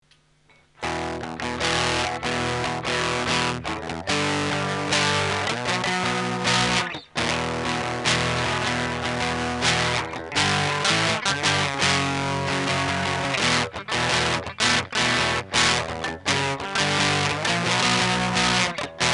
Low gain crunch

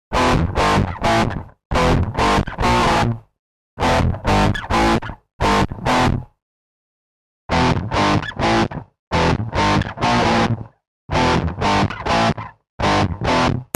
mu dist free spks

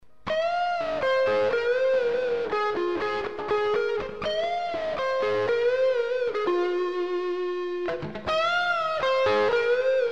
bluesbreaker

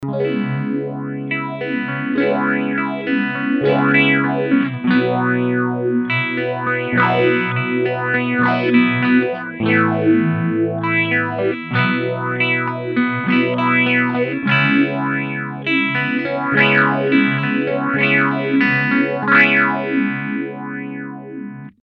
Ross Phaser Clean Rate13 Depth full

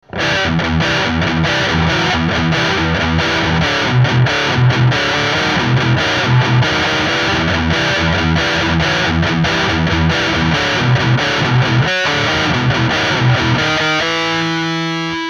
overdrive; jackhammer

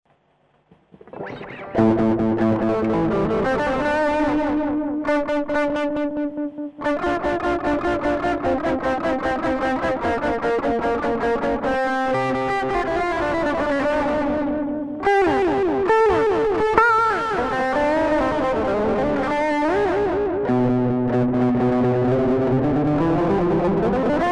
ELUR K-IV Analog Delay

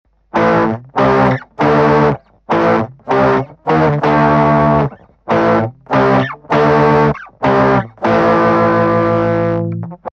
Rock Voice

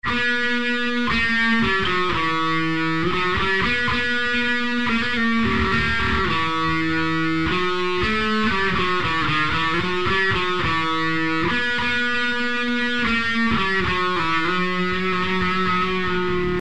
mod solo combo

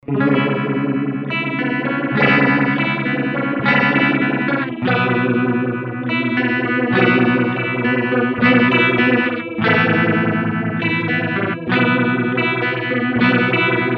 Ross Phaser Clean Ratefull Depth0

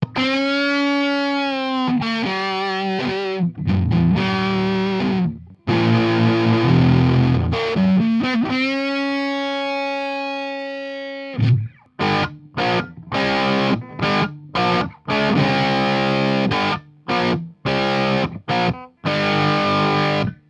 dod250 smoke

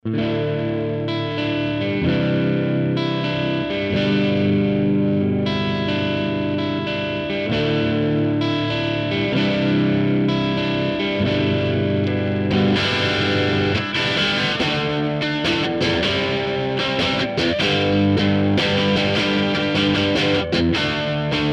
HotBox Crunch

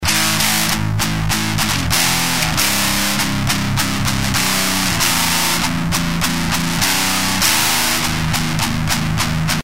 Death Voise

